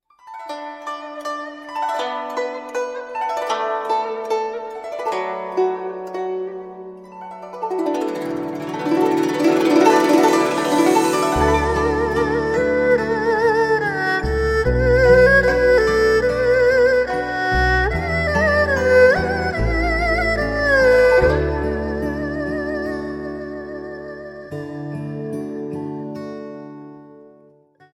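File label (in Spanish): Música China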